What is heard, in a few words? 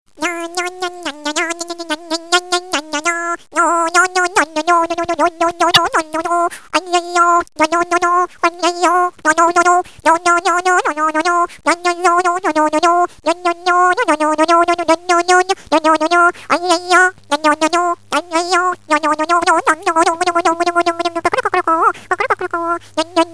Chipmunk Mix